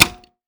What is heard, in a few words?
type; 1000fx; OFFICE